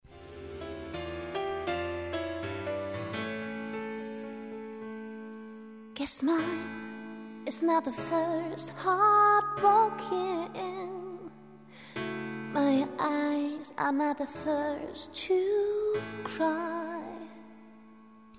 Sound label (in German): Gesang